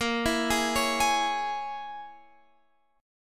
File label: A sharp Minor 7th Flat 5th A sharp, Guitar Drop D